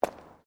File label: FOOTSTEPS WOMENS LOW HEELS CONCRETE STEP; Footstep Foley; Human